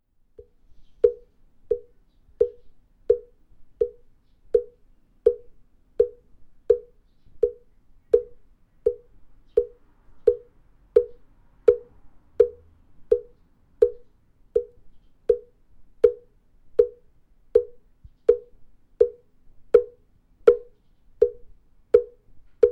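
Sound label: Buddhist wooden percussion instrument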